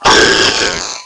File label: child alert; cof; children